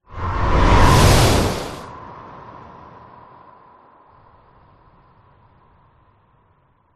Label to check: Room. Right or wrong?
Wrong